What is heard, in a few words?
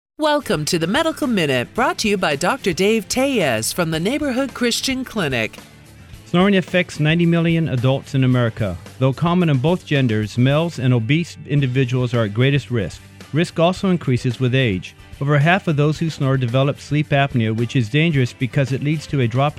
Snoring